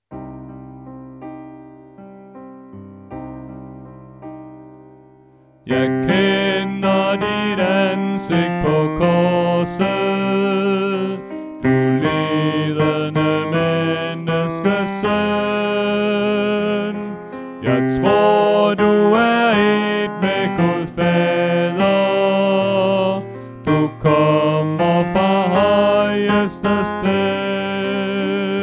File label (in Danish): Tenor; Påske; oevefiler